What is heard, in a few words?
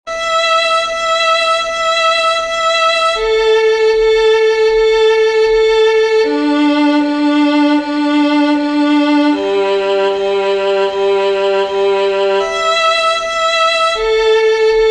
OPEN STRINGS, fiddle